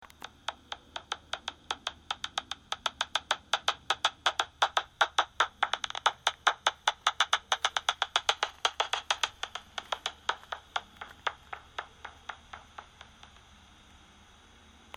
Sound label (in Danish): sydflagermus